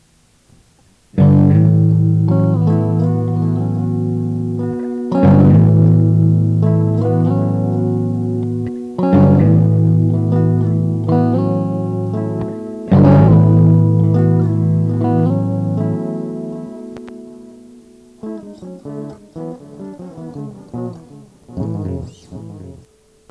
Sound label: time transiting guitar piece